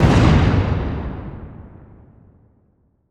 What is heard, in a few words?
Explosion Civil War Era Cannon Fire; Fire and Explosions